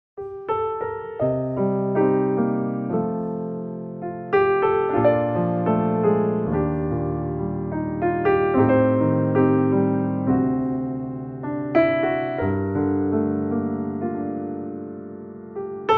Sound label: easy jazz piano piano cover